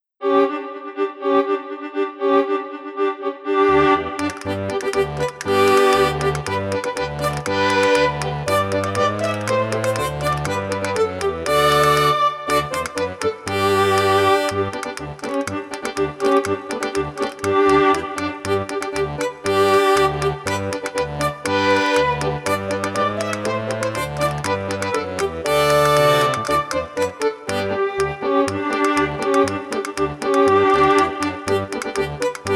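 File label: instrumental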